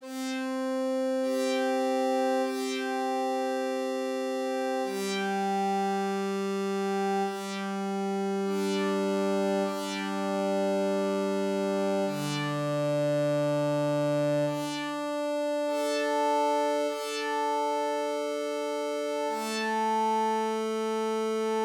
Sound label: ascending fifths bright pad; 12et